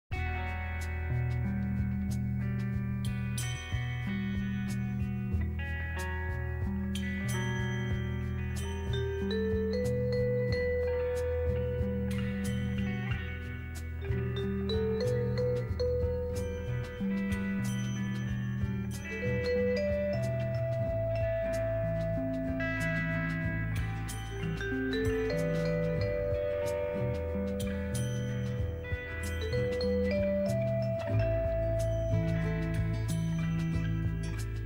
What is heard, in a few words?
Music Bed